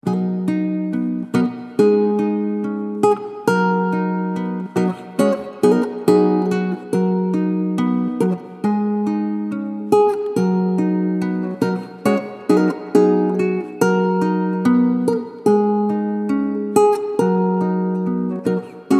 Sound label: Acoustic Guitar; Master Room XL-515